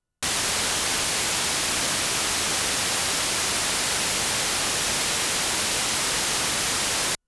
Noise C0; fleet keys Samples

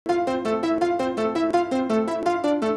Reverse

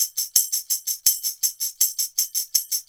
Tamb Loop

